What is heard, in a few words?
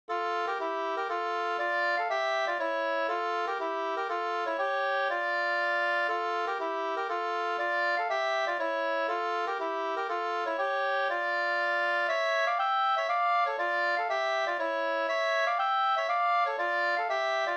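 polska, two-part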